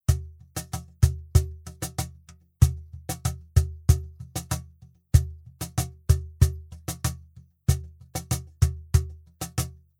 Cajon Groove offener Pitch Control

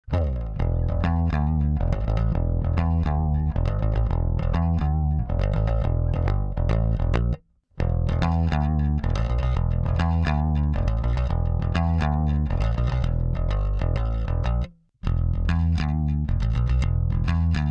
Rickenbacker EQ flat mit horn hi low ohne horn hi lo boost

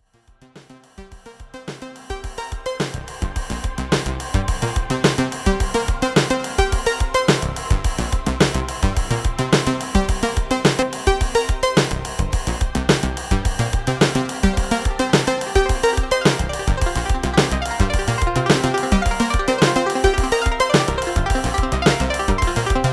Drumtraks mit J4 und OB 8

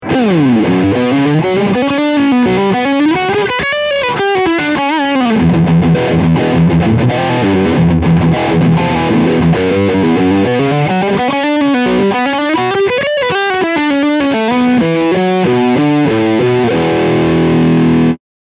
Steg PU Distortion Lead

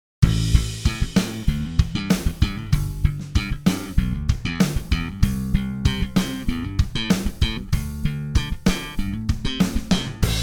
Drum Bass unbearbeitet

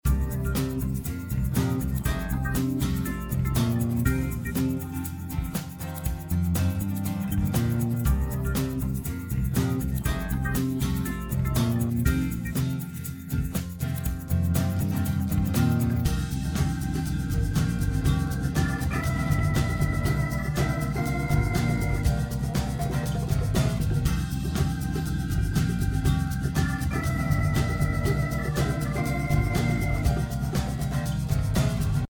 song ohne vox; steinberg spectral layers pro 8

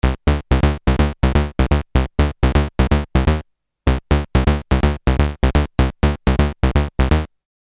Polivoks pro sequenz

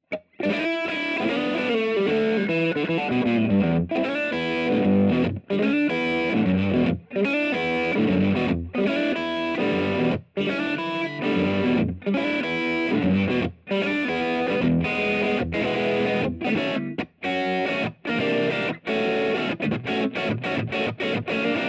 E Gitarre OD ADA8200

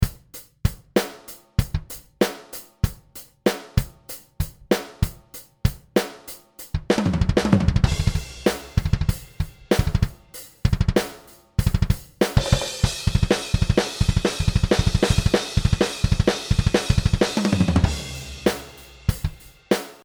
Rock ohne Ueber

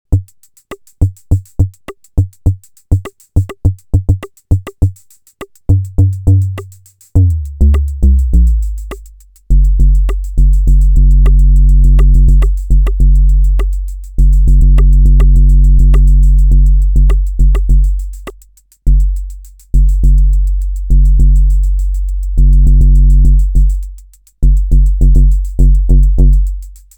Bass Drum Modul

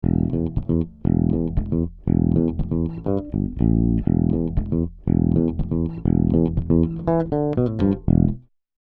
Summit Audio EQP 200B E Bass ohne EQP 200B

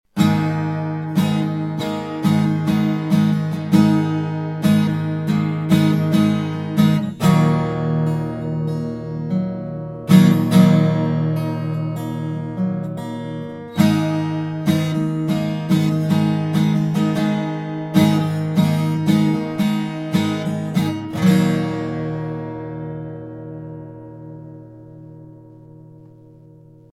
Gitarre

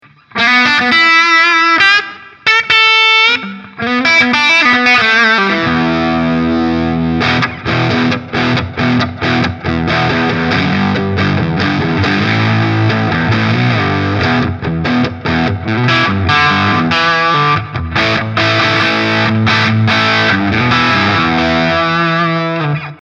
High Treble 4h Normal 12h PRS Steg

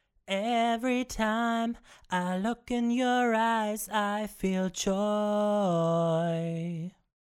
Vocals Leise Noten verstärken Laute Noten abschwächen; Melodyne 5

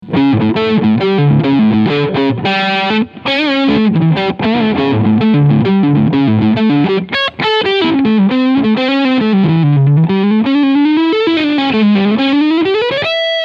Chapman ML1 RS Cunchsound beide Humbucker; Rob Scallon

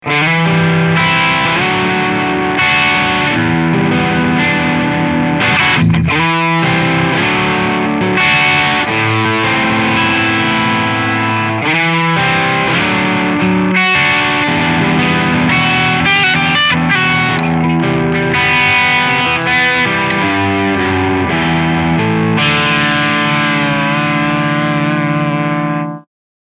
Crunchsound

